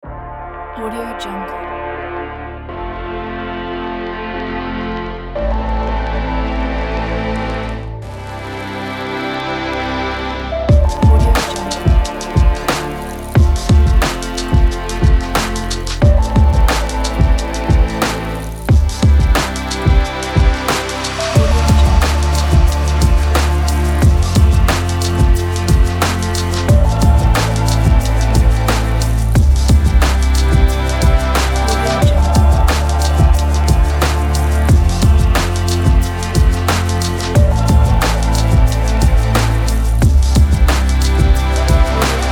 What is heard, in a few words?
inspiring trip hop